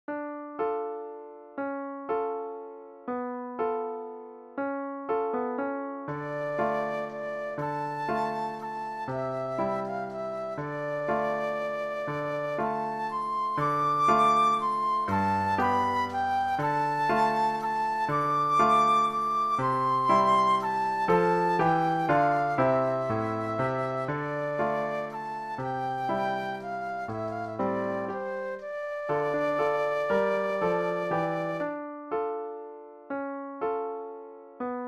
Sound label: flute solo; scarborough fair